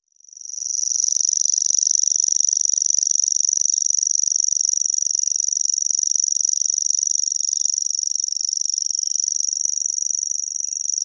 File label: sverchok obiknovennii locustella naevia